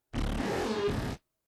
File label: video static distortion short bursts; rizzy; prosoundfx